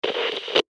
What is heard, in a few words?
Cb Radio Static; Technology; Miscellaneous Electronic; Blastwave